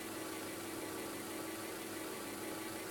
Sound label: Battery Indicator Machine Broken Loop